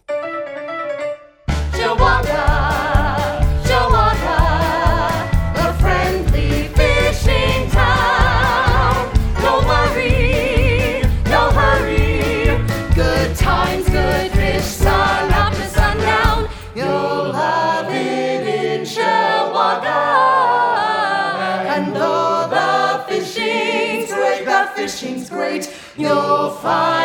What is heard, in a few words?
Jingle; Shewauga